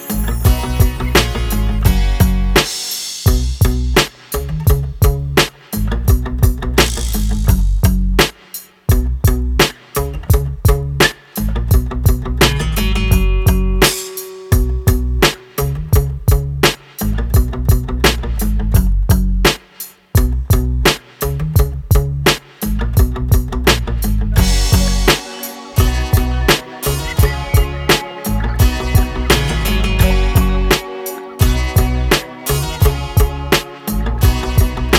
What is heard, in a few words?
Angel Instrumental